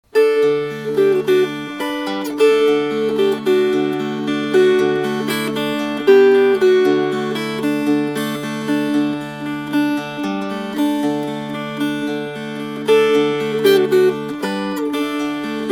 Fingerpicked

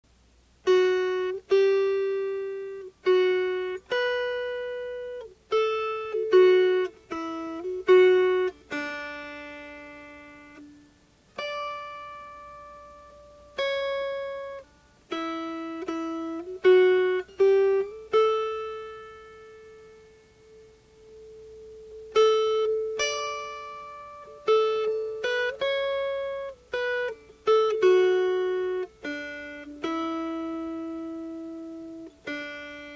Unquiet Grave Melody

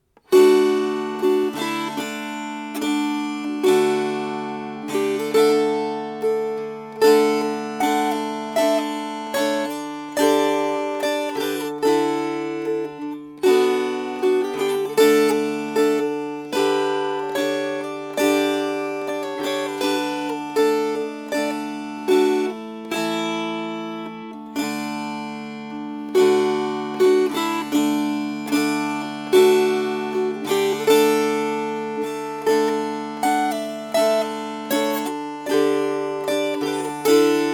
Strummed

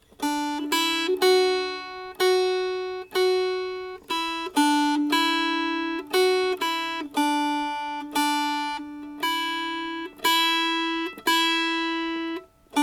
Melody, Dulcimer; Across The Western Ocean

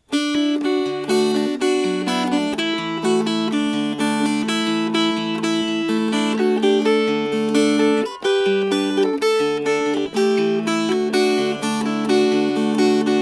Fingerpicked, Dulcimer

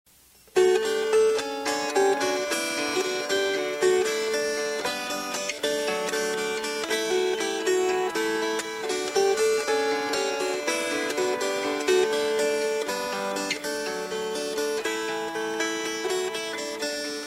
Fingerpicked

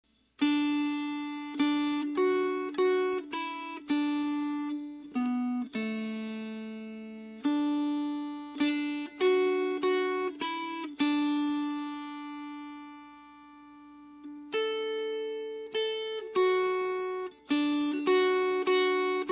Melody

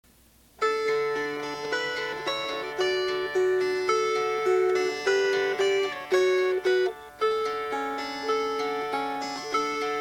Fingerpicked

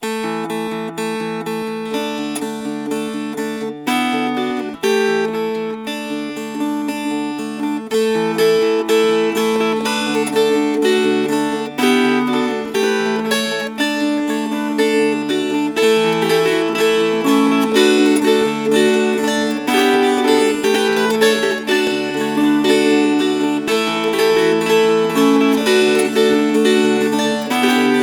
Fingerpicked Round; He That Will An Ale-House Keepe

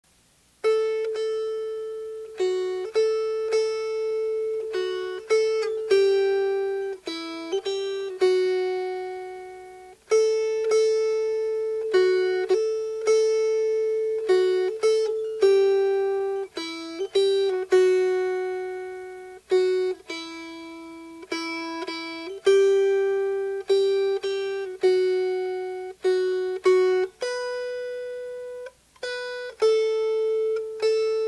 O Come, Little Children Melody

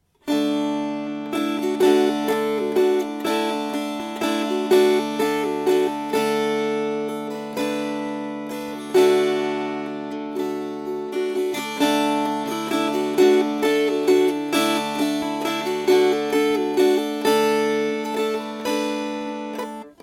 Strummed

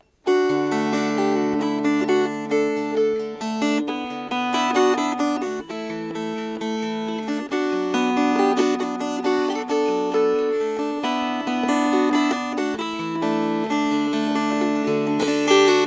Fingerpicked

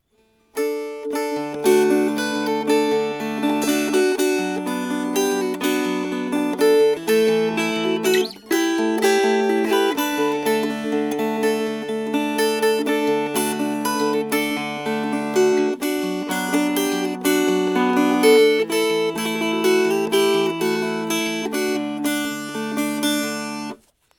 Strummed; Hayseed

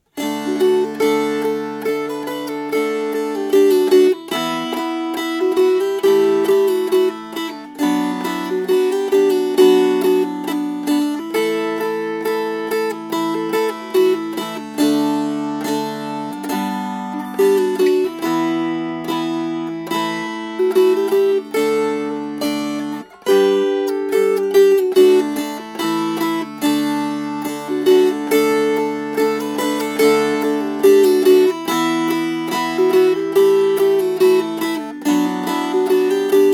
Strummed; Cosher Baileys Engine

